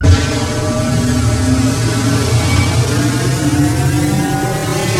warpstart; Earth and Beyond; EA Games

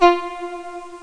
VIOLA; Leonardo The Inventor

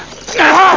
magic hit; szenarion

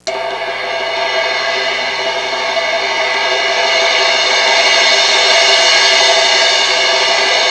cymbal roll, percussion instruments; Sound Effects for Multimedia - Volume 1

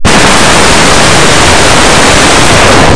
drawer

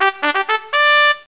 tada

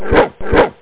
BARK

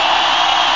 CROWD; VOCALS; eXtended Instrument

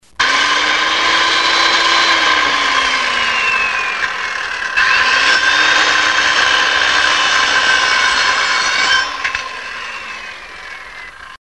drilling; machine; motor

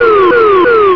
Zoop; SAM™ Intercept; Symantec AntiVirus

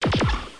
bullet

